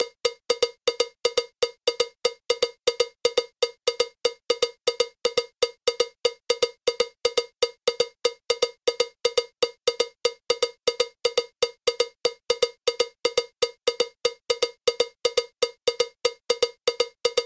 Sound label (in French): mambo cloche